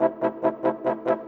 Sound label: Horns, Orchestral; Sonic Foundry (Sony Creative Software); Orchestral VOL-2; Modern 12